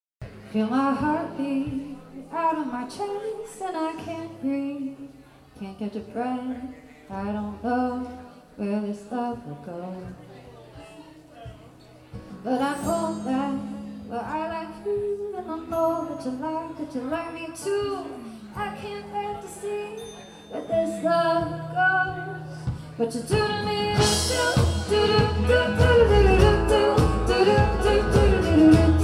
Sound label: Heartbeat